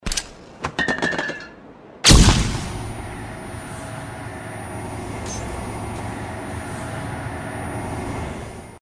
Flashbang Throw

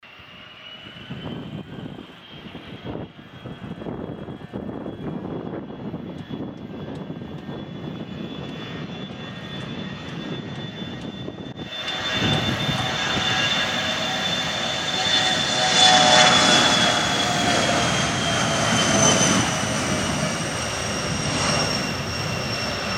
Air Plane Take Off

